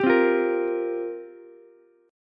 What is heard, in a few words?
F Suspended 2nd Suspended 4th F down Ukulele; strummed; JGuitar